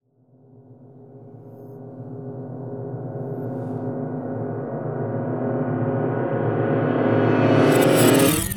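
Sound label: Reverse Swipe Strings, Cinematic Metal Impacts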